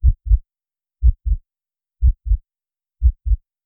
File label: slow pounding heartbeat; sound effect